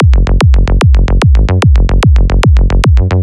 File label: sequence kick melo 148 bpm